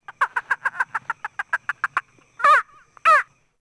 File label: Jungle Bird; Ambient; Civ4 beyond sword